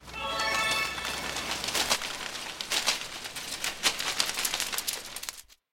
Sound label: sound, progressive jackpot dollars; wbg games